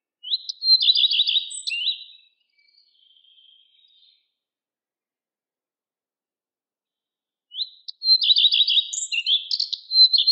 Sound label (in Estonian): lepalind; spring; linnud